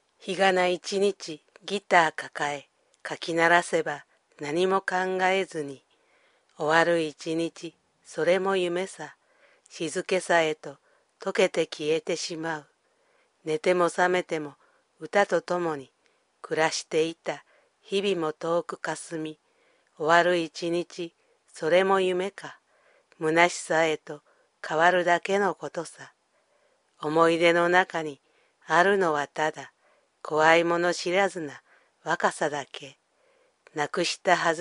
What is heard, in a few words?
guitar